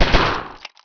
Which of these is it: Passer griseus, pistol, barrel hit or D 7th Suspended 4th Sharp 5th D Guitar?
pistol